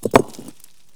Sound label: Brick cracking